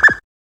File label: fx beep; Sonic Foundry (Sony Creative Software); Ugly Remnants VOL-2; Beatstuff